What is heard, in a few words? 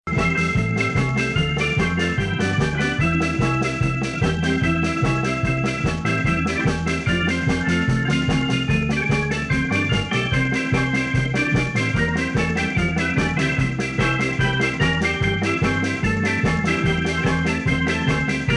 rock, surf rock with organ and claps; suno